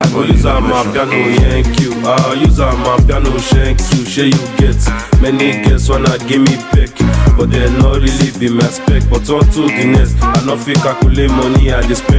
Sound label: Amapiano